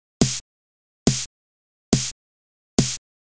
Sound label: snare